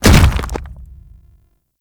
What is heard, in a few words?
Golem Step Default; Warframe; Footsteps